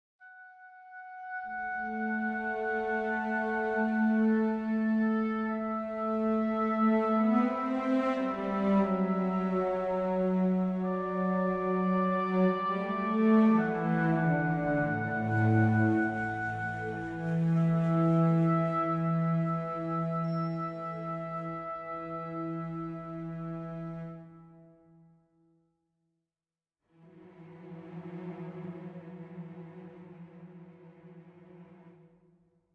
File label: World Music